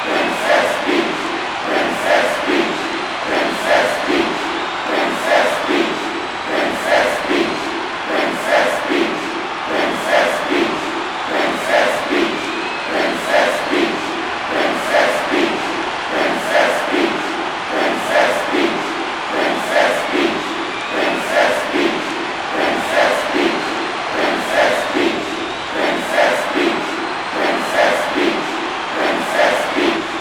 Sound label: Crowd Song; Peach's Crowd Song; mario strikers charged gamerip